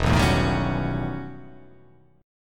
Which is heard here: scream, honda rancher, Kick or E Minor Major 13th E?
E Minor Major 13th E